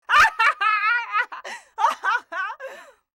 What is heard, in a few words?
laugh